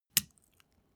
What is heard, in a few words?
step barefoot wet